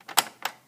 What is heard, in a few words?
ff on, Tape recorder +2A; Mass storage; zxsp